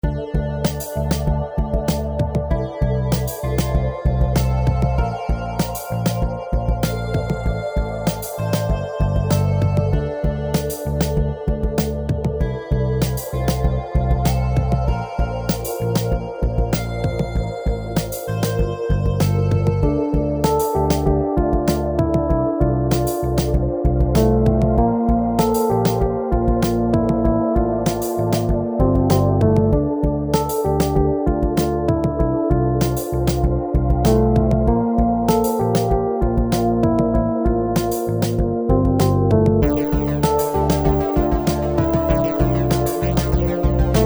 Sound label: Ambient